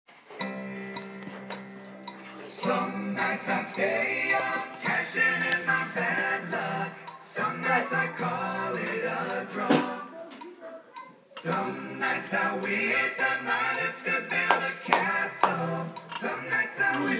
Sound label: clapping piece